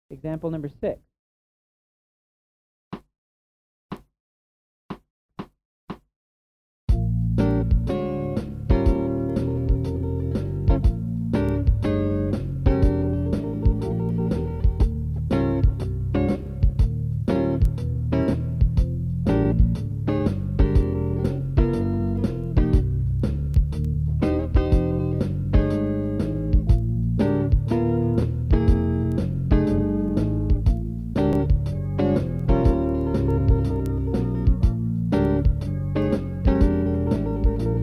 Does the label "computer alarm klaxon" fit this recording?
No